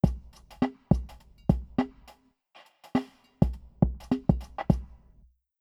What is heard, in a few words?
drum balloon klein rond recum portal 10m